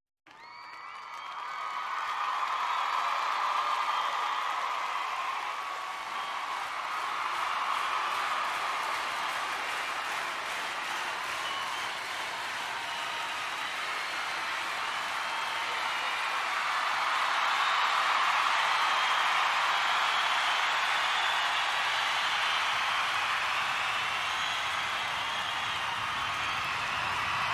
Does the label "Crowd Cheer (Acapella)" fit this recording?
Yes